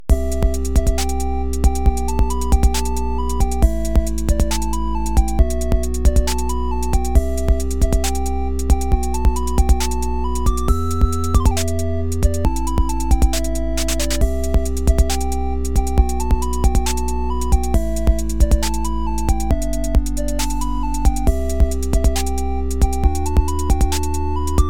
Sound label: ringtone